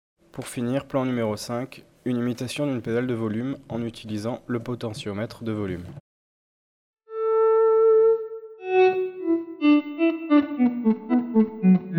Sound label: violin effect